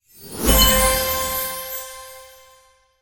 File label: Medal OR; Puddle